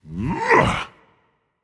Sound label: grunt attack; smite; thor